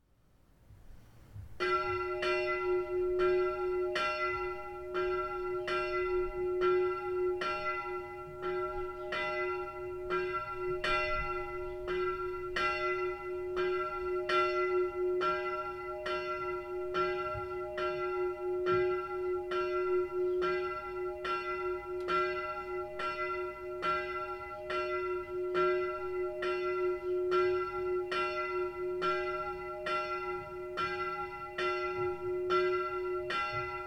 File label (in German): Glocken St. Mariae Geburt e